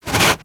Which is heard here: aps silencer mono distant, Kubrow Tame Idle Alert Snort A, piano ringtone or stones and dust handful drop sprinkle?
Kubrow Tame Idle Alert Snort A